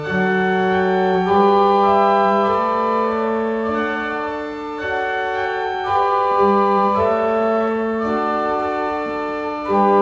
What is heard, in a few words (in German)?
Oktave 4, Orgel; Dettenschwang; Renovierung